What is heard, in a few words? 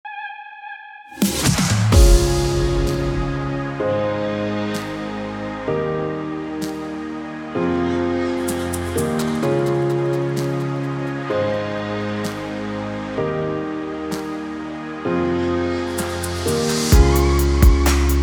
Instrumental Version